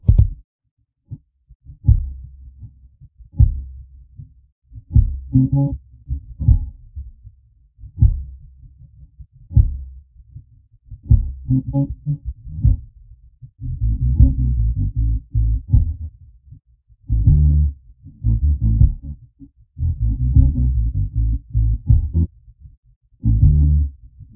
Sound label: bass